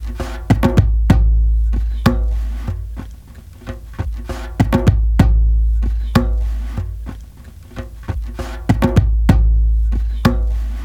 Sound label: djembe grand cavern dry vs